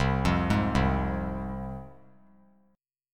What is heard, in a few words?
C Suspended 4th Sharp 5th, C, down, chord; Csus4#5 arpeggiated; JGuitar